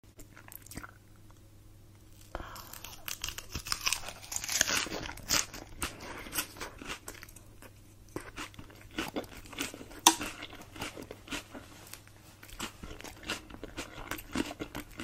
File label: eating lettuce